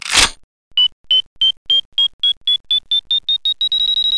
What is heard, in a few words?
Bomb Activate